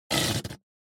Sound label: Poo Poo Cushion